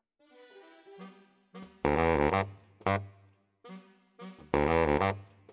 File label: Bari, RNR for piano